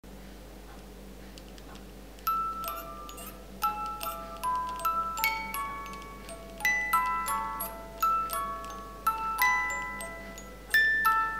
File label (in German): Donauwalzer Spieluhr